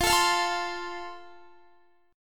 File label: F Major, Guitar; strummed; x,20,19,22,21,19